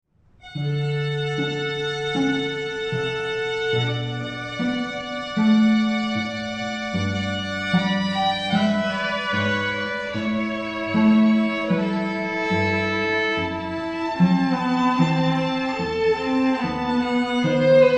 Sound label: strings